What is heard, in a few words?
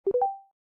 Usb; AllCall Mix2